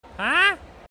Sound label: heh; bender